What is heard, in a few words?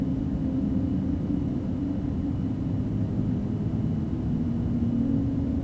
Ambient Storage; Among Us